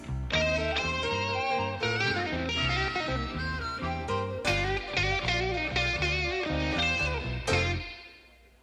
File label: blues long riff